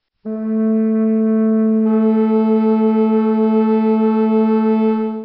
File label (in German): flöte; Makam-Musik